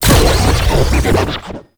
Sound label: Infested Lich Virulence Cast; Warframe; Abilities; Zeke